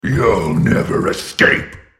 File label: ultimate effort; Skarner; en US